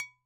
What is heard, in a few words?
Bottle Struck